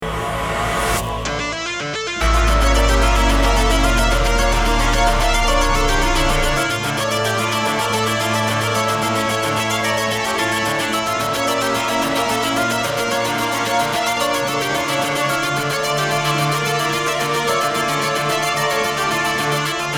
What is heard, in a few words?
synths